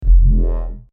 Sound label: Obtain Shield